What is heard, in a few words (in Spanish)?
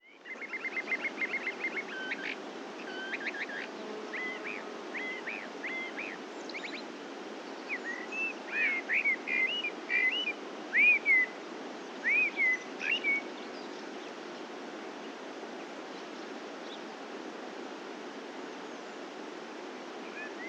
Ambiente bosque mediterráneo